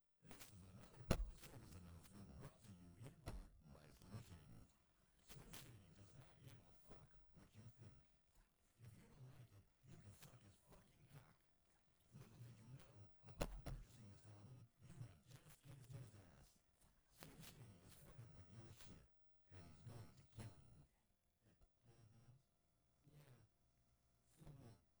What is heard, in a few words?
Instrumental